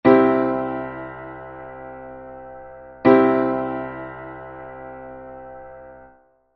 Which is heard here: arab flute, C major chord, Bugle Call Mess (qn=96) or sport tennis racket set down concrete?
C major chord